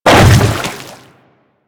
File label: Hammer Boss Step Water, footsteps; Warframe; Grineer